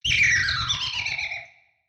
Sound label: ghost